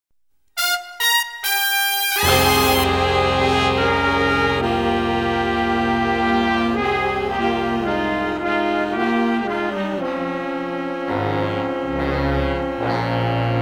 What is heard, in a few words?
jazz orchestra